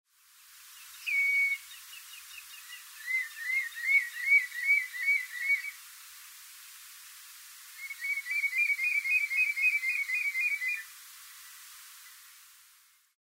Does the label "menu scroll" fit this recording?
No